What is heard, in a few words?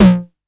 909 TOMS